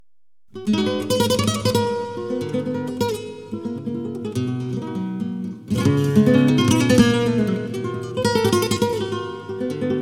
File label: Solea